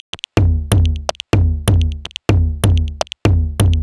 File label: Changeling 125bpm, House Drumloops; Zero-G House Fabrik; 90 s Sample CDs